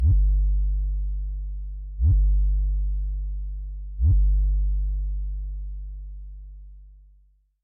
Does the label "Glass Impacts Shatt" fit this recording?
No